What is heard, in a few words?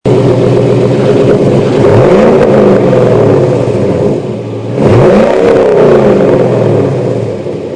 Rev, Engine; 2002 Chevrolet Camaro SS; Revving Up